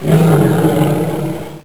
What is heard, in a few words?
Roar; Saban; Tigerzord